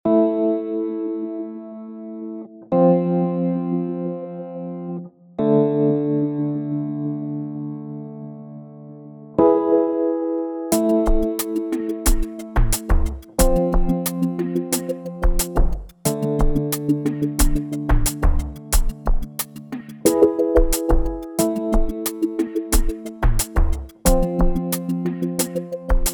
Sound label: romantic, corporate, music